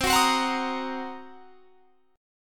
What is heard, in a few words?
C Minor 7th Flat 5th, chord; Cm7b5; strummed